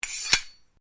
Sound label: Blade Swish Low, Sword; Foley sounds for melee weapons; Swords (Sound Effects:Combat)